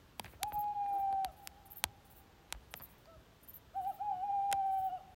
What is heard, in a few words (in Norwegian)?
ugle natt; fristad